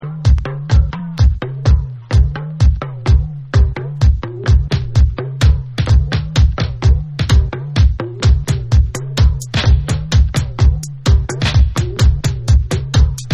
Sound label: TALKING DRUM Beat